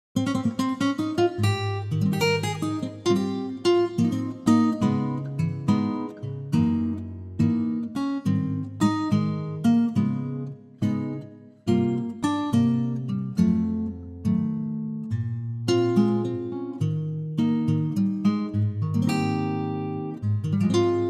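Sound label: A flat acoustic guitar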